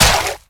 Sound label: Step Water, Infested; Warframe; Zealoid Prelate